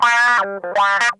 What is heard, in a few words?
TIMER, Funk Guitar; Zero G - Funk Guitar